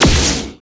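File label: FG SHOTS, future gun; Total Control - Action Cutz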